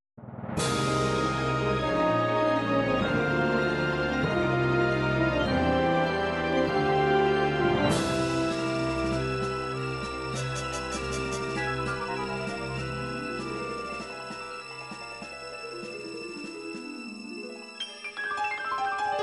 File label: jazz, midi